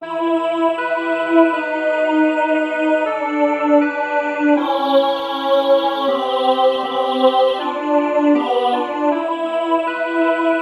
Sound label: alto